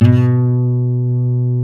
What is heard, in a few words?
B NYLON, Guitars